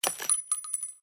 casing; Escape from Tarkov

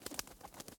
flap wings short; Rust

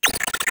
drone chirp

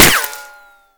impact dirt; Modern Warfare

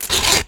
hit; Rust

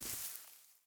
footstep hide grass walk; Rust

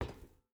footstep boots metal walk; Rust

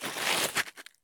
stop asphalt; Escape from Tarkov

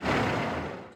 engines; World of Tanks

